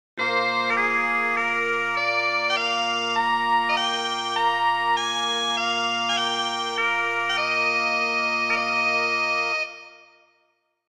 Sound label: bag pipe